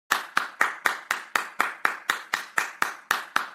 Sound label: clapping rapidly